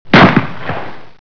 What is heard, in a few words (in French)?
pan; Reperages 94; tortue